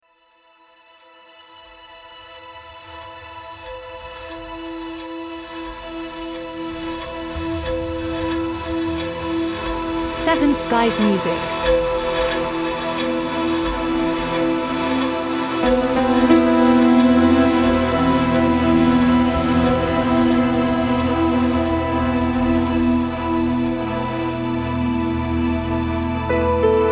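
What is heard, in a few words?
Ambient Reflective Watercolours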